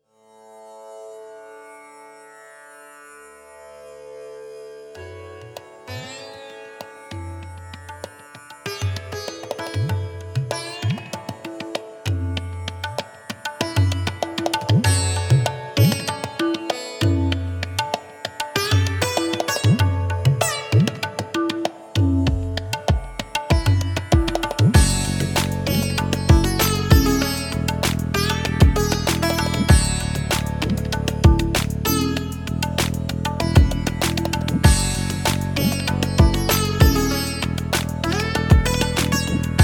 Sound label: ocean instrumental